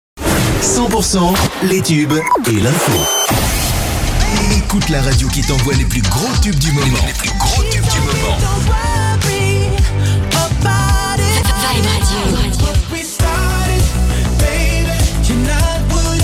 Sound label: JINGLE